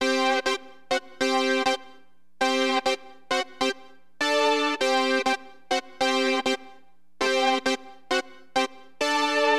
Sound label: JUNO, Keyboards; LiveAct Pool; AcidJazz Funk